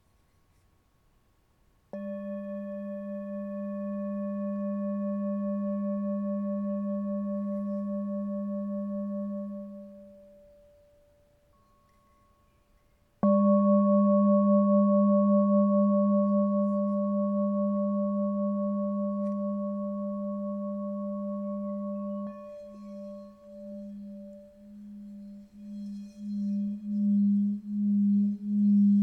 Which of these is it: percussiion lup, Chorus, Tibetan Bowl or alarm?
Tibetan Bowl